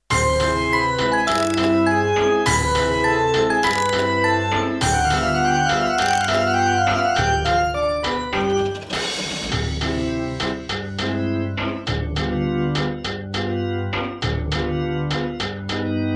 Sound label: Instrumental